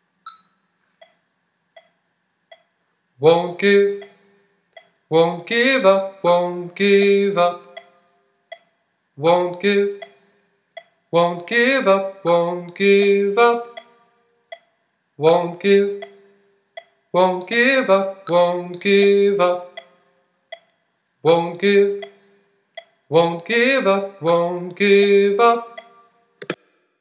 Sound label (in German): Endteil Tenor